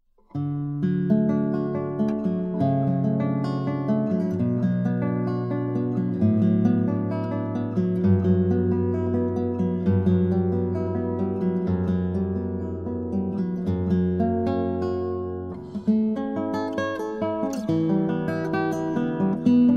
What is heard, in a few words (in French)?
Arpegus flottant Guitare